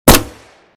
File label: rifle silenced fire; swb; attachments; silencer